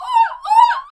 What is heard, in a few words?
Grito Oa Oa!, Vocals; Roland BK-5